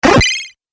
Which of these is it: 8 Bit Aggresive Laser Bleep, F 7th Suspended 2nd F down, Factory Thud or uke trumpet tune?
8 Bit Aggresive Laser Bleep